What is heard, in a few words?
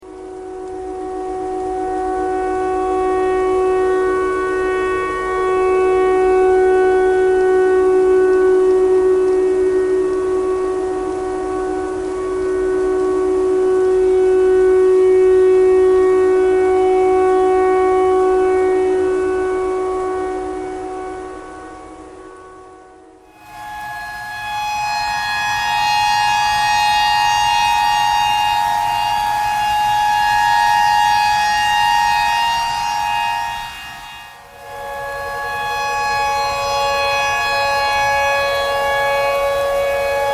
tornado siren tornado siren thread pentatonic